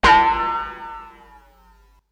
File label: boing